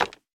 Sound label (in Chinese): 树脂砖放置声